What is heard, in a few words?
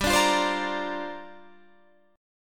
Bb 6th Add 9th G